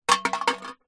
impacts metal water bottle flask crushed drop ground